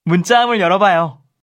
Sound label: Text Tone; Yoosung